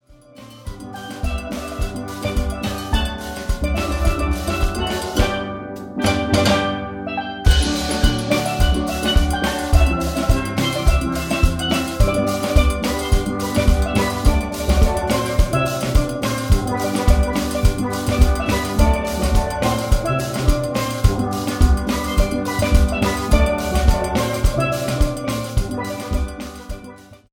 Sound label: steel orchestra